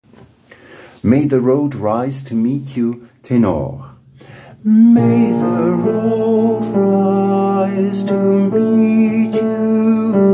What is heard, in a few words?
Tenor